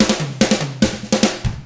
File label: Double Platinum Drums; 50S ROCK